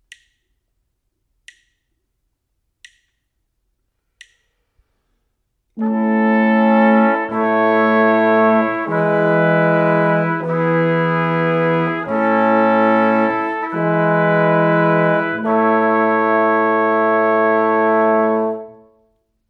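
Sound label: harmonic dictation, brass quartet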